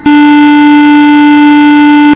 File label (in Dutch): snaar 4D; tuner